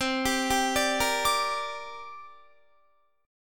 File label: C 7th Suspended 2nd C down Guitar; C7sus2 arpeggiated